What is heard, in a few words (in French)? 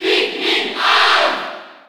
Cheer French PAL; Alph; SSB4